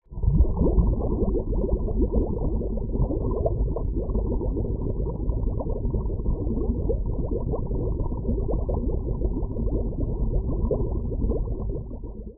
Bubbles Underwater Slow Deep - Diver Bubbles Submergence; Hollywood Edge; sound designer's toolkit v2